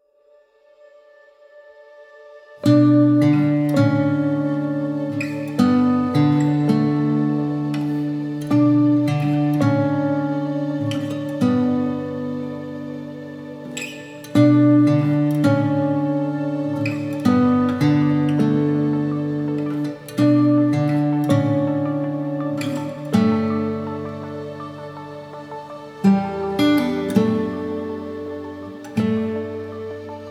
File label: Find Relief Sad; Narrative Mind